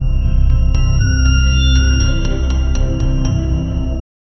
soundlogo dark sci fi hor, electronic

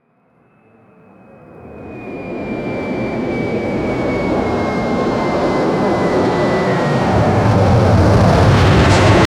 sound of two aircraft col, collision

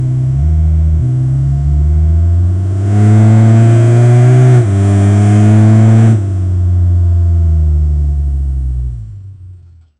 v6 car motor sound; internal combustion engine

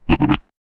fox taking damage; mmorpg

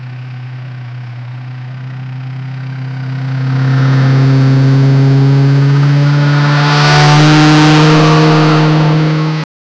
sportscar

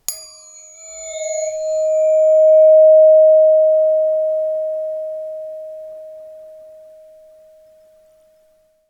mid range katana blade, short decay

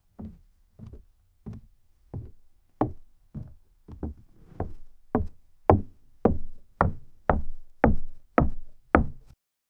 footsteps on wooden plank

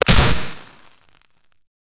explosion tnt and confetti

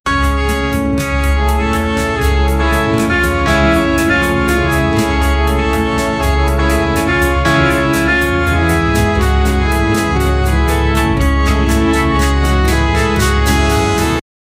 light japanese melody wit

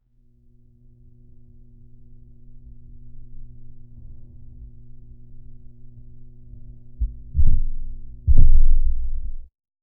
studio ambience

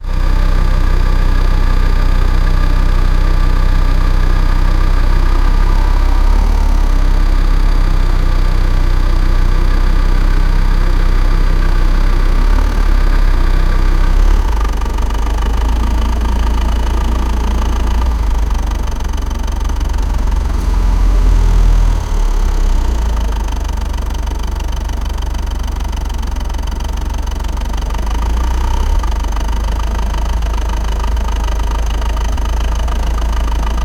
BMW E90 N51B30 engine sound, car

